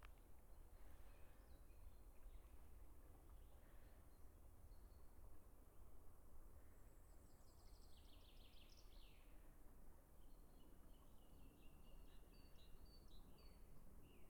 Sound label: Nature Forrest Noise binaural